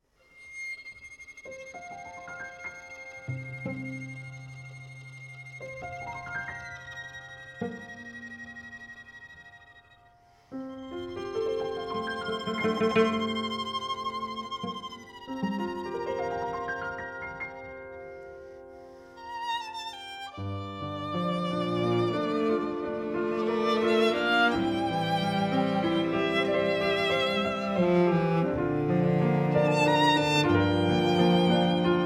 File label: Klassik Trio dry; Savant Audio QRS